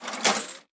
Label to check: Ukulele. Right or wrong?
Wrong